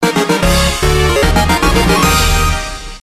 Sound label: Game Clear